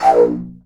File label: Pipe Flute Dry